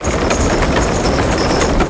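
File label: destroyer droid rolling loop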